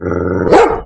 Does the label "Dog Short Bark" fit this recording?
Yes